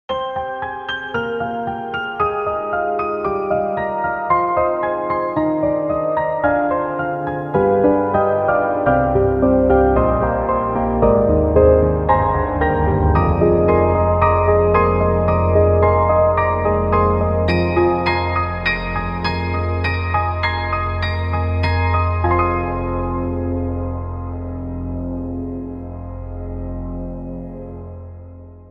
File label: Soft and Tiny; reFX; Nexus2